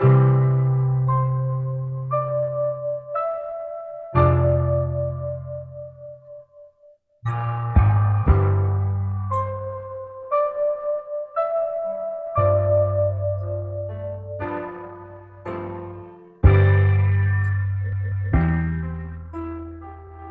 piano, Loops Sad

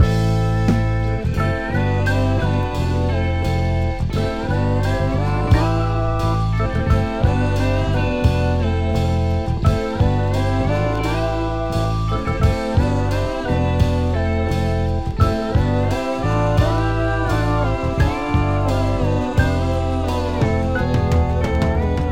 keys, sad loops

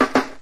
two toms, Drum loops, Rolls

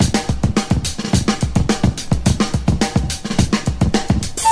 flute assist, drum loops, funky

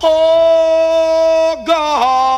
"oh god", male, SUNG

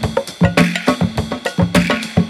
Drum loops; Just Add Drums

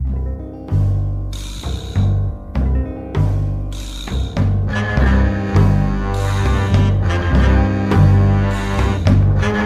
horns, Loops

